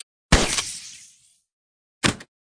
spin Sounds; netent

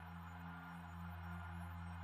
reel Spin; netent; twinhappiness

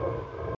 reel Spin; netent; deadoralive

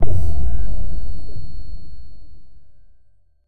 spin button click; netent

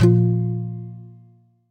quick stop; netent; happyriches

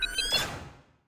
wild Win; netent; multipliermayhem

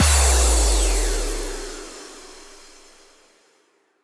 mega Win Text Change, slots; kingofslots

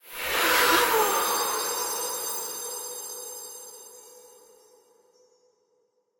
character Free Spin Win; netent; bloodsuckers2

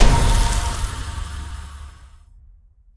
scatter Hit; netent; bloodsuckers2

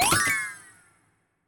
spin Button Click; netent; reelrush

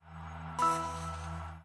autoplay Quick; netent; twinspin

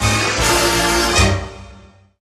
mediumWin; netent; deadoralive

